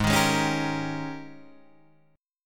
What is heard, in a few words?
Ab Major 7th Suspended 2nd Suspended 4th Ab, down, Guitar, Open G